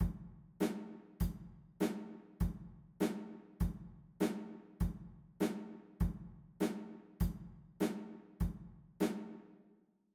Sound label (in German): Schlagzeug